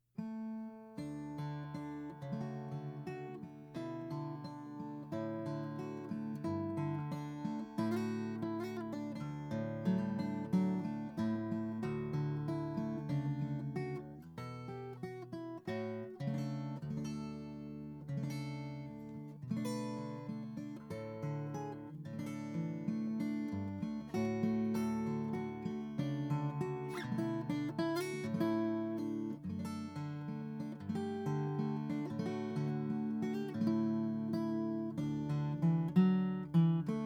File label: Guitar